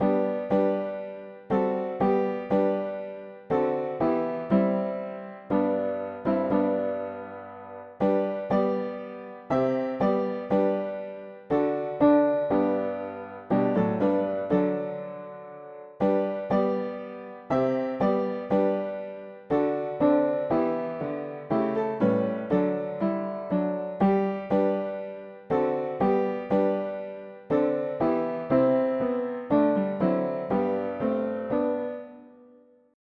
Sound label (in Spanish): mono piano; hymns; Himnario pequeno 2Pte